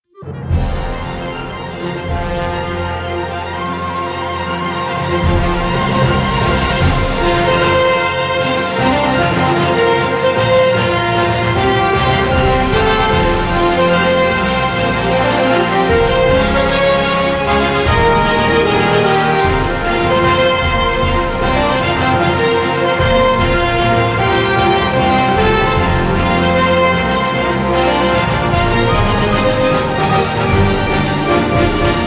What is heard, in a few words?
winds and brass; chris hein orchestral winds und brass compact